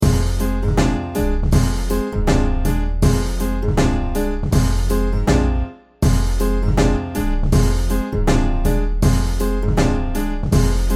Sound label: move to the left, French Horn